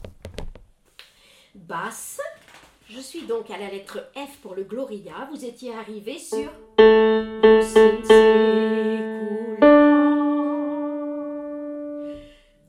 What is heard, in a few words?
Magnificat Pachelbel Basse